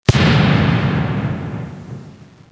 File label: Gunshot Explosion